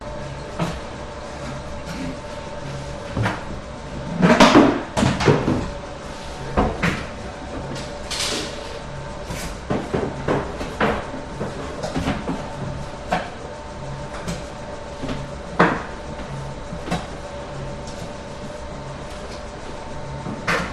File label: Bakery Baker Preparing Dough Dough Mixer Running In Background; German